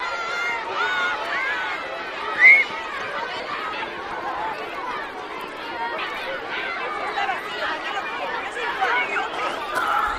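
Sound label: Children Group Yell Scream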